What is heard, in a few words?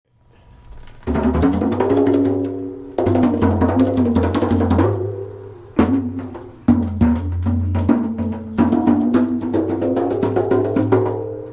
Jamming on Nada drum; Muruga Booker; Sage St. Studio